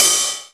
RIDE

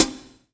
HH ROOM; E-Lab; X Static Goldmine 2; akai

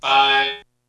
SFVX

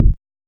HSE-BD

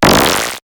SYN-FX

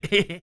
LAUGH, Vocal Jazz Fx

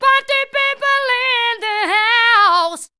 ROCK ME, Voice Spectral

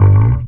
BASS FX; E-Lab; X Static Goldmine 2; akai

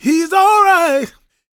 GOSP MALE, Vocal Planet Gospel; Spectrasonics; Vocal Planet Vol.1 Gospel; akai

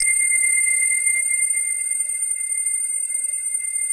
PAD, ATMO PADS; Best Service; XX Large

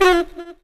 SAX RIF; E-Lab; X Static Goldmine 2; akai

